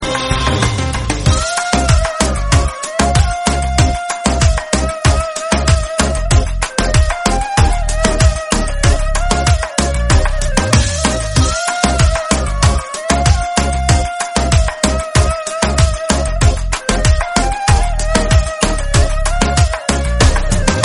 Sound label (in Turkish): yeni tuerk zil sesleri